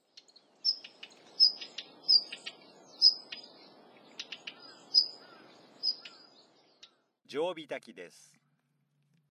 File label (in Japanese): jobitaki, ジョウビタキ（♀）; watarase; hirano